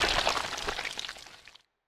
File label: Blob moove